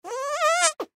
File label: Fart Armpit Long Male Twelve Yrs Boy; Male Twelve Year Old Boy; Human; Blastwave